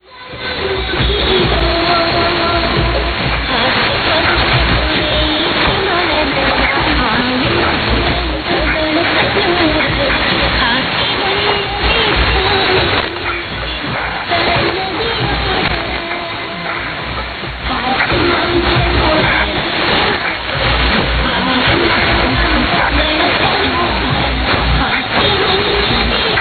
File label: music; 1539kHz; Asianet Radio